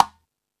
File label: Latin Drums, E-MU Pro-Cussion